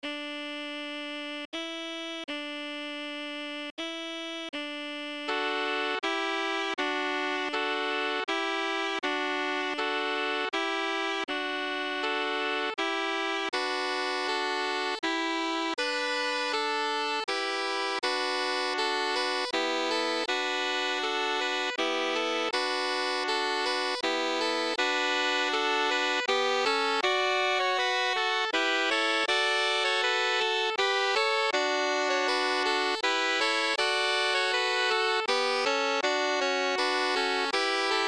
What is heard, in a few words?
Bells Trio; William Byrd Suite